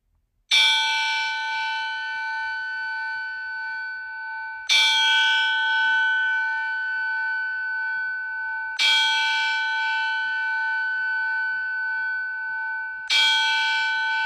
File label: bell ringing 13 times